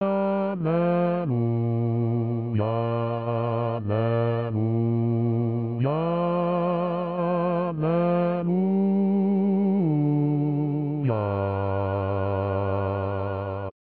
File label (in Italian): Alleluia Bizantino Bassi